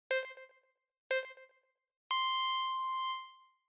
Countdown

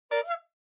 Car Horn Fake

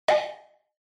Pop Low; Onoma

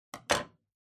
Scissors, Put Down

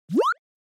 Motion Pop

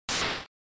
Noise Phrase, NES; NES-General

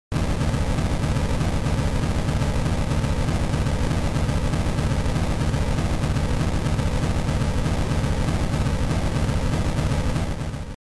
SNES RPG Collapse

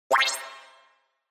Cyber

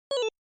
SNES Soccer

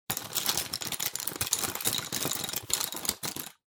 Pencil Case Rummage

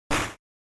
Noise; GB General

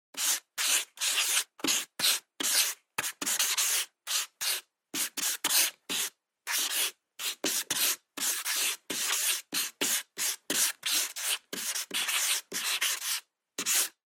Felt Tip Pen Write

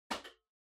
Flip Clock Far Button On Strong

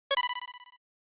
SNES RPG Turn

